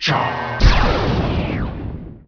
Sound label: weapon; weirding; Emperor - Battle for Dune